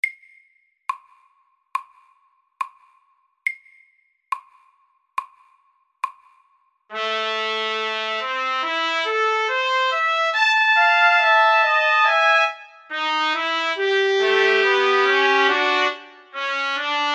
Duett i H-moll

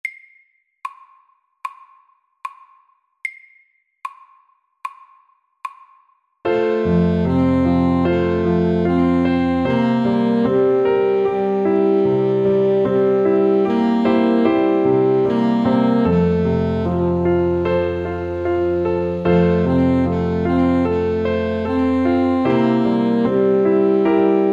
Walesisk melodi SLOW 75

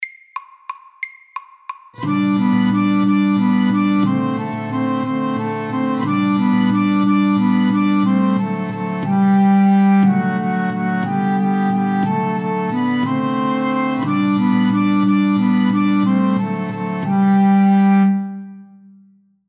Irsk melodi FAST 180

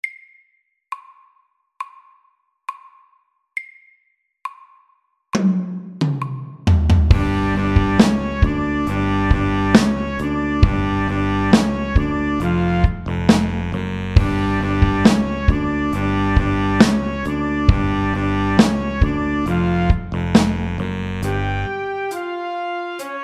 funk SAKTE

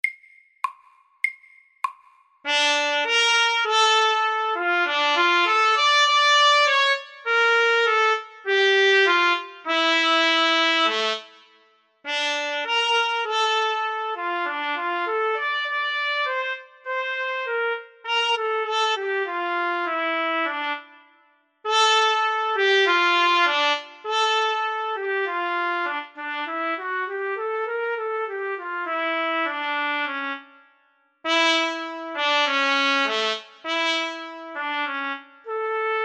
Lyrisk etyde i E-moll og E-dur

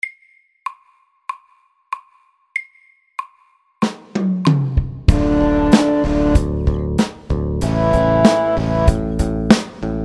Elektrisk rock SAKTE

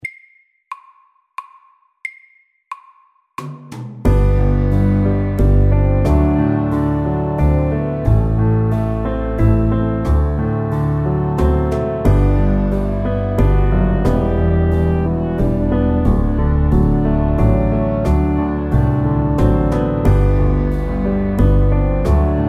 Soft rock FORT

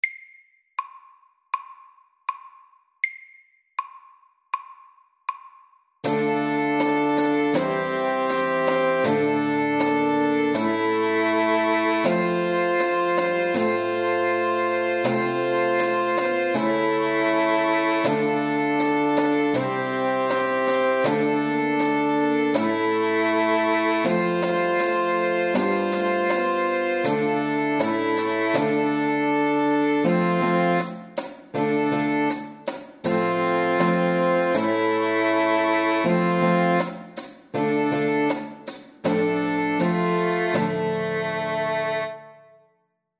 Old English dance SAKTE

